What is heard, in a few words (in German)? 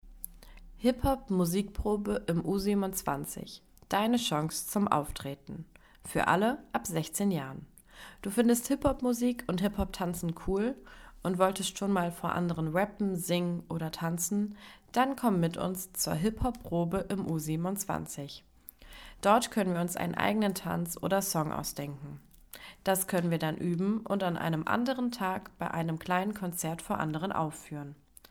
Hip-Hop Musik Probe